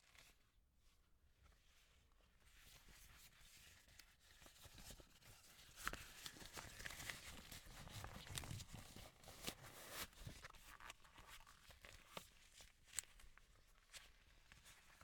Rubber Gloves On Off

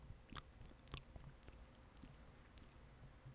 Bite

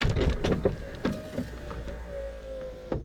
Window Down

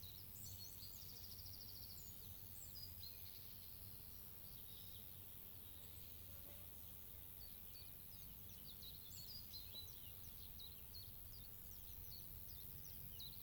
Forest Meadow On A Summer Morning

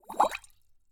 Bubble Short

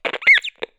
Cracklebox Crunch Then Ping Down

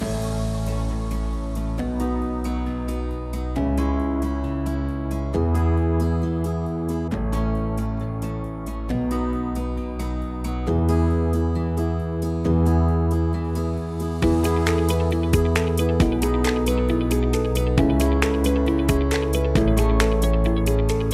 Motivational Folk Loop

